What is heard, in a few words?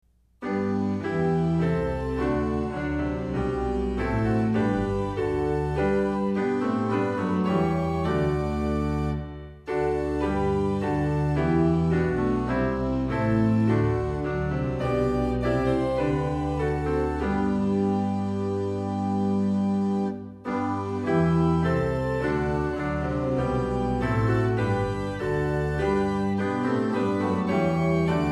SDA Hymnal Song Tune